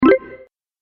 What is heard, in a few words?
Positive Win Mini Bonus, Video Game Sound Effects